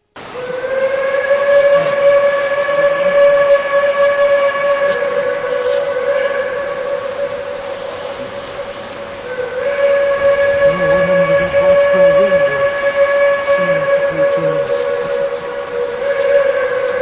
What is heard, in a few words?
Climax, steam whistles; Hillcrest Lumber Co